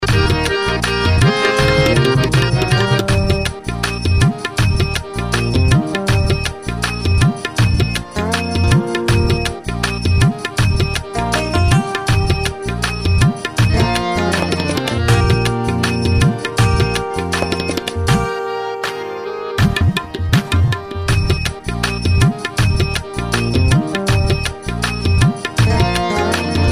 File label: Karaoke Song